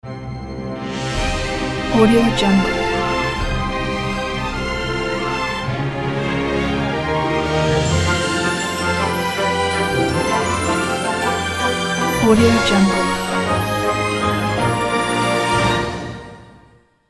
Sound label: big adventure ident